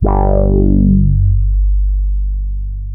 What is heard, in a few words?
G2, Boom da Bass; E-MU Producer Series Vol. 6 – Analogia Project (CD 1)